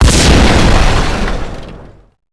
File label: Explosion Small; Max Payne